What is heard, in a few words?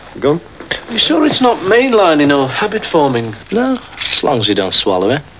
swallow